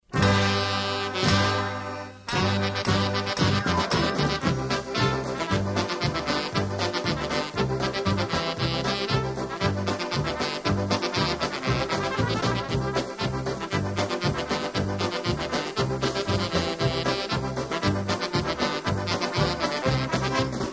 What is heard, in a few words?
Polka